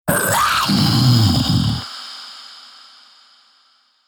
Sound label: scary run growling